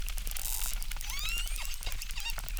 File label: Chirp zap, acquarium